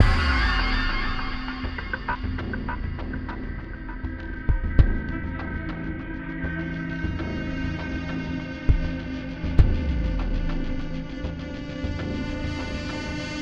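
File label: SYNTH PERCUSSION loop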